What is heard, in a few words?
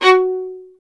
STR VLN, Solo Instruments; Miroslav Vitous